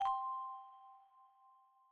incoming other message

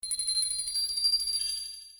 Launcher

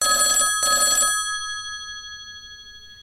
incoming call

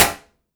hat metal

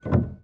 piano

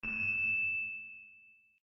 MESSAGE

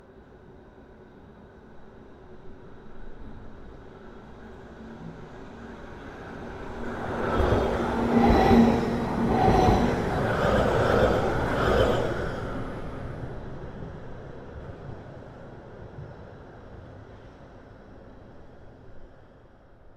Tram Pass By Fast; 3dstreet

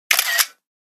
shutter sound; 3dlook camera

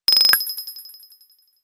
alert

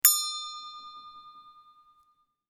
ding

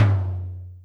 drum tom lo soft

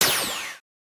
mission

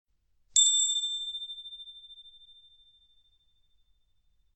simple bell

